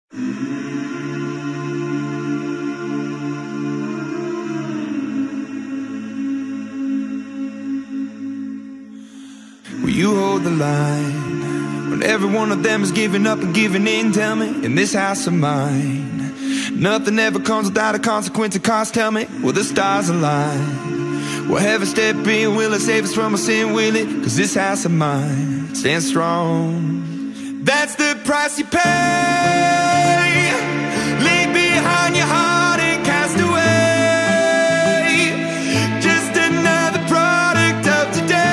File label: Hiphop Song; Lyrical Hiphop